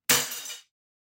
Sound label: Glass Break; SoundsCrate